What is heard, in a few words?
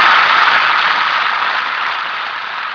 rain taper; the noise of the rain; on the / corrugated metal roof